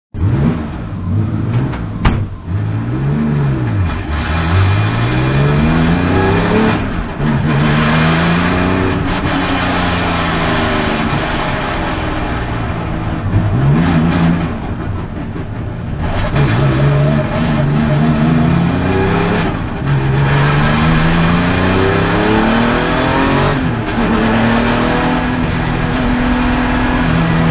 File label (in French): GT2, Porsche, A bord; le son des moteurs; (1996)